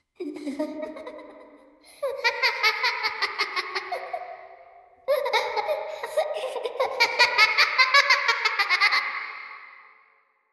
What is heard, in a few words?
Little Girl laugh; Halloween